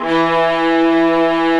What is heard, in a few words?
STR, Vlas Sect, Agitato; STR Vlas Sect1-2; STR Vas1 Agitato